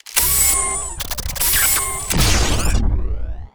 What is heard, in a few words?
Gun Reload Scifi, Weapons Foley; SFX Kit